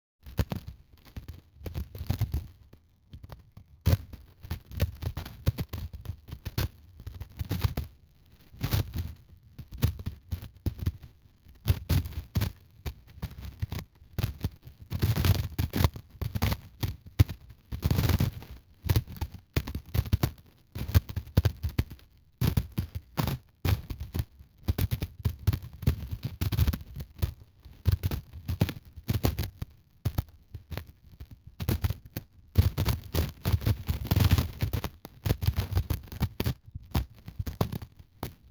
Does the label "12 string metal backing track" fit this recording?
No